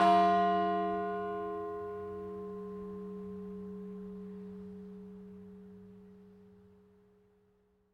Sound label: bell; teardown; ps5 windows xbox series xs